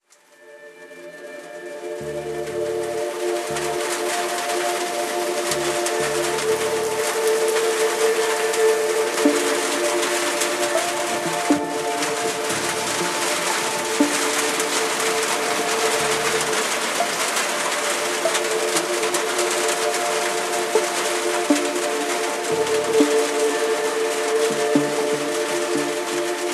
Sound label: relaxing rain nature sounds music